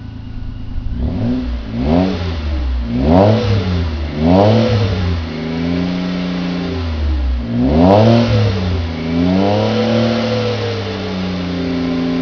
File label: svt exhaust